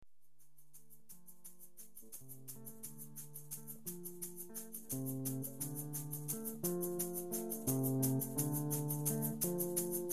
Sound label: Forest Blues